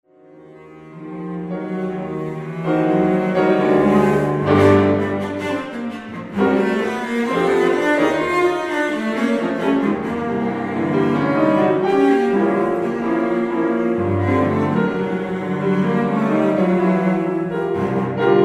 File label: Cello Sonata